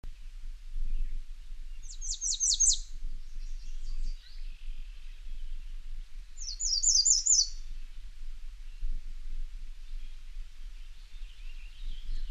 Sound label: american redstart